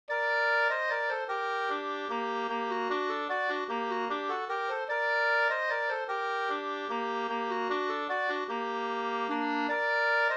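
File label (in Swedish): vals, two-part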